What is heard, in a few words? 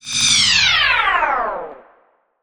Sci-fi Engine Vroom Down